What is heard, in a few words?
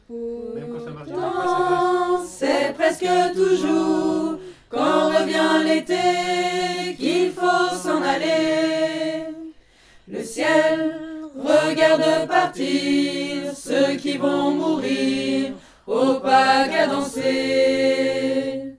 ALTO